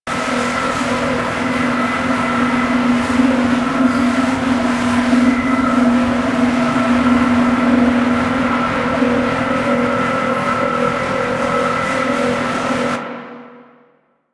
Eye Tornado Loop; Ambience; Orokin; Warframe; Orokin Moon

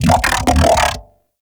Mech Ambient Vocal; Nechro Mech; Vehicles

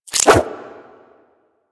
Nunchuck Start Whoosh; Warframe; Infested

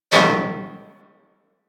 Bullet Impact Debris Pipe

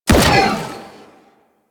Energy Rifle Split Shot; Warframe; Venus; Venus Grenadier Spaceman Agent

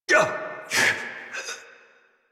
Death Adult; New War; Adult Operator

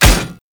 Hammer Impact Armor Damage; Weapon Impacts; Player; Combat; Tuvalkane Hammer

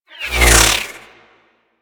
Cluster Shot Whizzby; Enemies; Abilities; Teralyst

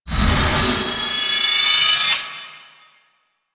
Heavy Hammer Attack Charge

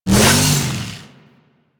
Saw Melee Swing; Weapons; Warframe; Grn Ghoul Saw Player

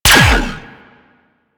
Laser Fire 3D; Warframe; Corpus; Ambulas

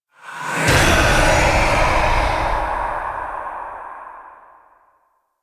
Ghost Expel Spirits Cast; Warframe; Priest Quest; Rell Ghost

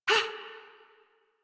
Pain Adult; Warframe; New War; Adult Operator

